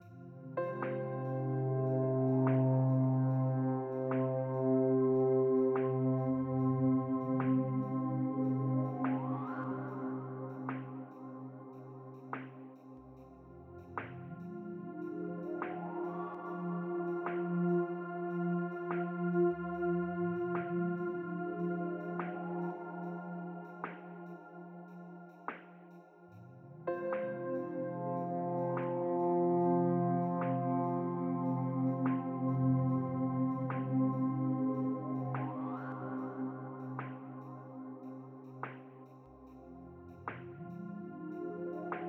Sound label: fade to drums and background synth; mcsm music; Netflix